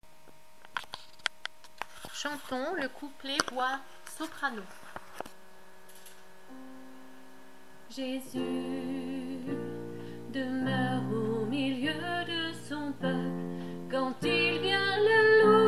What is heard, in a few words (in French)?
voix soprano lead couplet